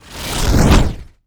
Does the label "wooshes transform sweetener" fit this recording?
Yes